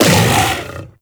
Kubrow Armored Vocal Attack Short; Warframe

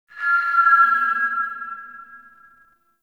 Interior Stadium Feedback

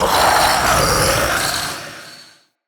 Male Vocal Attack, Enemy; Mockery; Mockeries

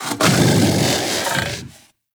Kubrow Armored Vocal Breath Walk; Warframe

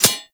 Arrow Impact Metal; Warframe; Bullet Impacts

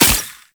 Spiderling Web Shoot; Enemies; Gameplay; Boss; Warframe; Oraxia

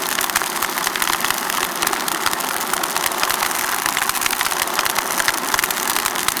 Large Fire; Warframe

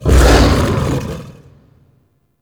Axe Weapon Wind Up; Warframe; Weapons; Tenno; Wolf Frame

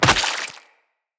Short Sword On Killed; Weapons; Player

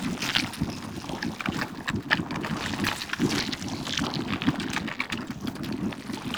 Infested Destructable Cyst Loop; Ambience; Props; Warframe; Infested Eris

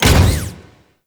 Leap Land Sweetener; Warframe; Venus; Venus Terra Moa; Abilities

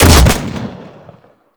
Fire Shot, Weapons; Warframe; Seer

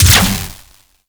Rifle Proj Explosion; Wisp

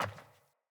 Sandals Walk Metal, Footsteps

